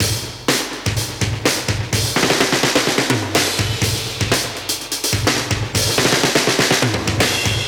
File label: 125 Classic Breaks, 125